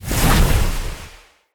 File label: Lightning Slam Charge; Characters; Enemy; Abilities; Sinecure; Banneret